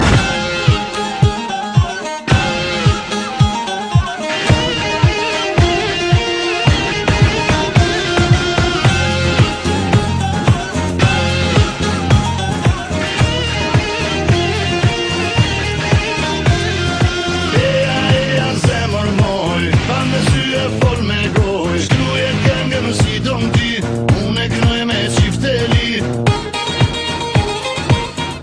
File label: Albanian